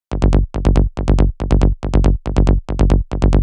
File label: Bass Loop 140 G Psych; Ghosthack